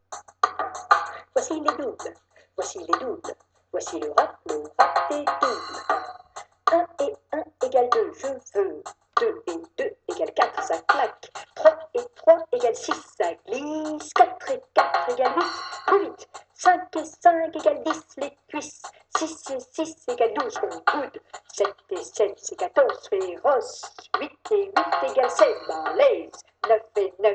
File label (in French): rap